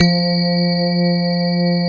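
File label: grand piano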